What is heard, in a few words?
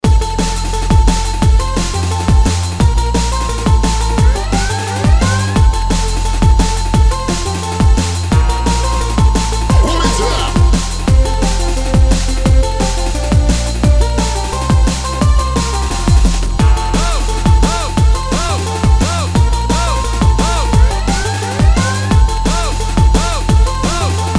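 jingle bells drum n bass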